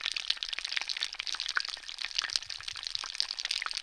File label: eggs oil sizzle